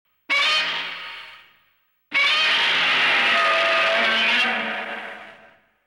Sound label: godzilla roar